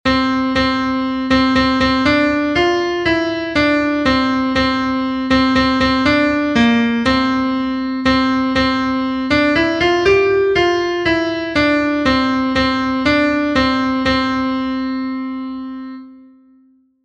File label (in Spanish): CONTRALTO, himnos en piano; 32 GRACIAS POR TODAS LAS MANANAS; cantos del camino